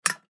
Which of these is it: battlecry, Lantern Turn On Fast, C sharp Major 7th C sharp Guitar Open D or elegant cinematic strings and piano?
Lantern Turn On Fast